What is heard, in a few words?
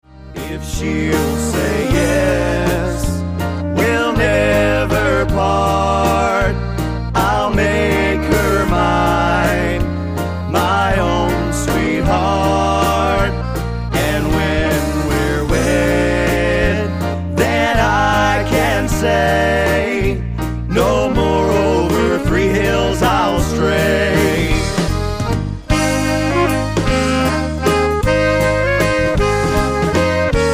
Waltz